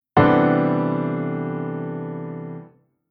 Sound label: Chord Poly